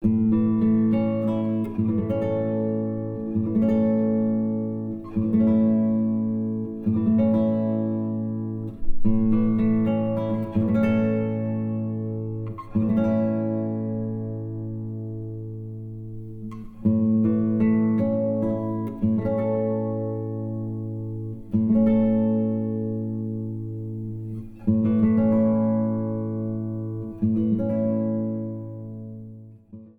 a dur akkord zum einstimmen der floete

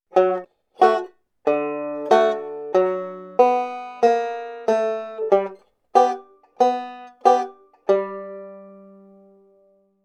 chromatische passing line

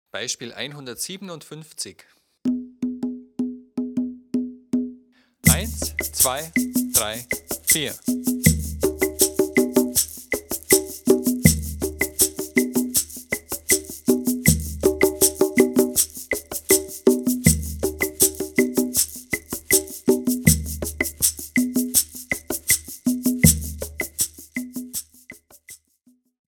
viererrhythmus

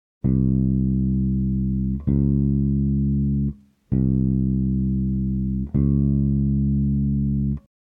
kleine Sekunde

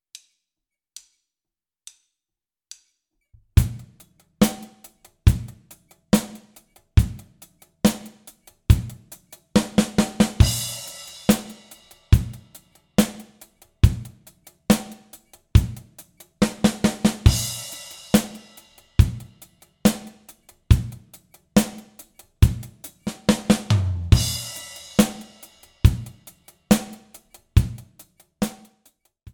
1 4 taktige fills

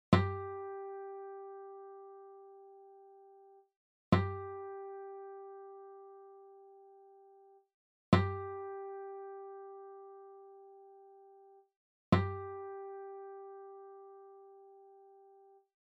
stimmton g

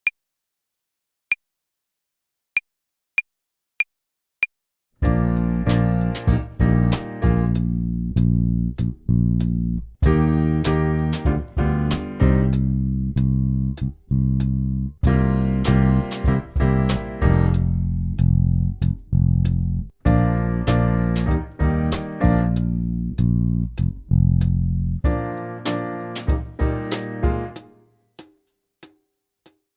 tempo und rhythmus uebung hihat play along

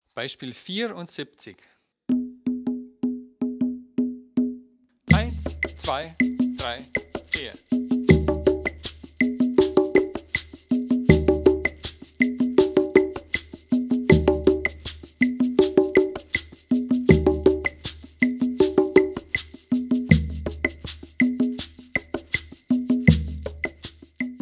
viererrhythmus

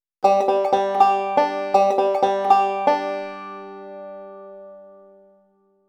single string triole und alternating thumb roll

